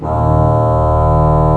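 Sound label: pedal D#1, organ pedal; AKAI S-Series CD-ROM Sound Library VOL-2; ORG PDL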